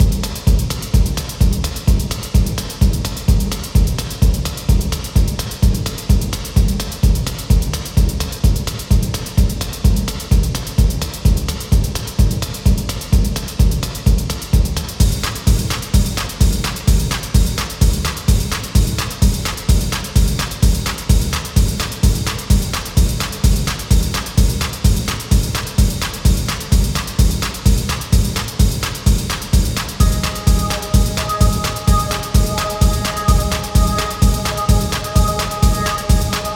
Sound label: music box